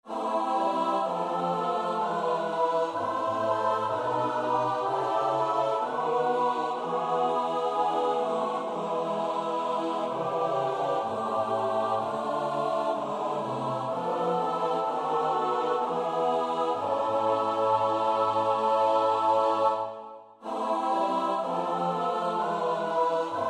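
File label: soprano alto tenor bass; Bach (Johann Sebastiaan); BWV 179; Ich armer Mensch, ich armer Sünder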